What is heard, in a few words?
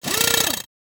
Ghoul Drill Punch